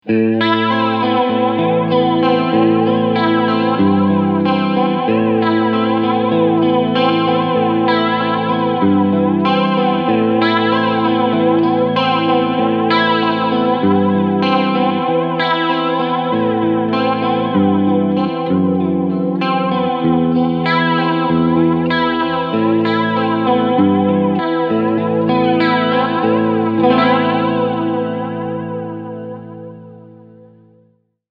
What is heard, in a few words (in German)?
3 Series Flanger Regler 12 Uhr Tape OFF Clean; JHS Pedals